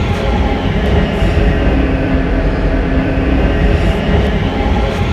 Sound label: Motor, train; OpenBVE